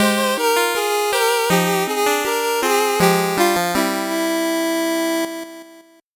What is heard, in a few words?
8bit jingle slow